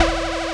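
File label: synth, Production Effects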